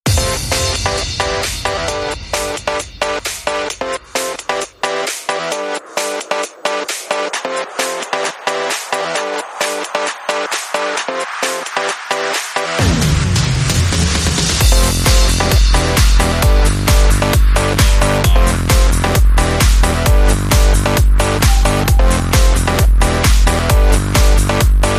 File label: No Guitars Strings and Arpeggio Synth